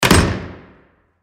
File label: Jail Door Prison, Jail Door Prisoner Cell Door Slam; Emergency; Door Slams (Sound Effects:Doors